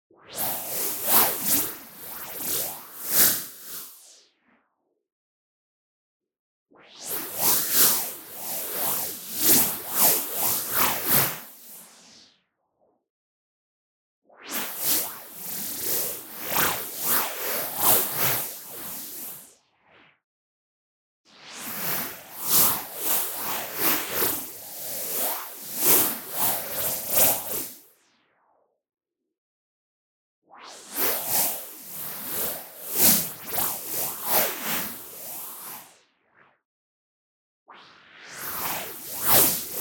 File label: MOVEMENT FAST Random Filter Movement; boom library; Anime Essentials; DSGNMisc